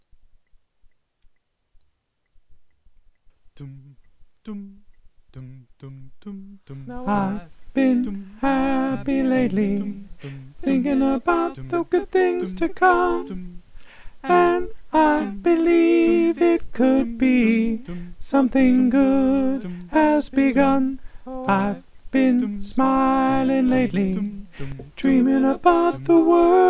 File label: alto